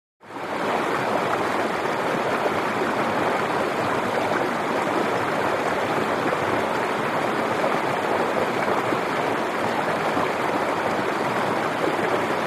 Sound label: Stream 3 Large Fast Ru, streams